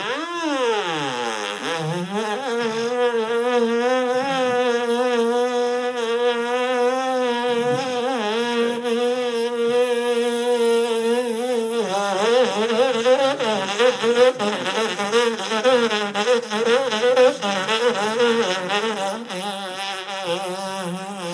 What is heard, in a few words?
jazz fart